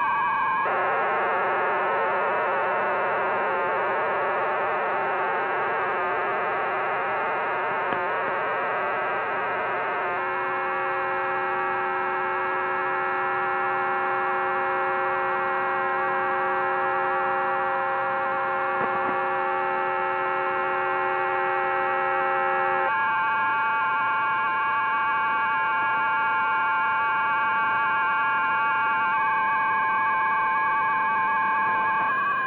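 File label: 16 channel VFT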